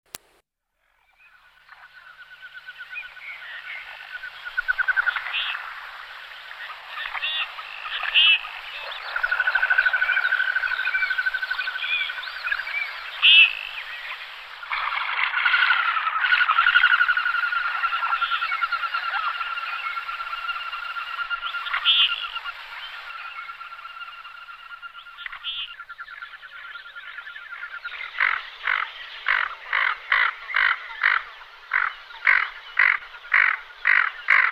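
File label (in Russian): obyknovennyy gogol golos, common goldeneye voice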